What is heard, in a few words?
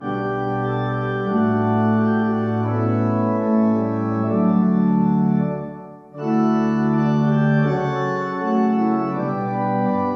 Hymn organ